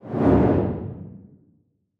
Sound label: Stormy, whooshes